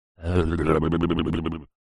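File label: cartoon disgust sound shaking head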